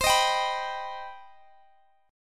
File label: C Minor Major 7th, Guitar, DADGAD; down; chord shape